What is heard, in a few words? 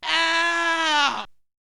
Vocal; ZERO G - Datafile DISC 1